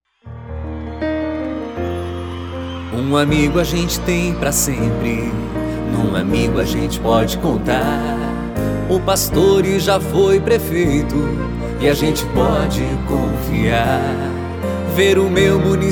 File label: JINGLE; campanha 2012; PASTORE E SAVARIS